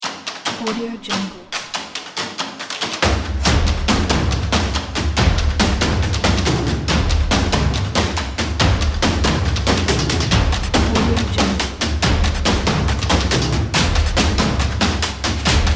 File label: energetic rhythmic percussion; sonicdreammusic